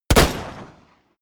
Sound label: pistol close shot; schusswaffen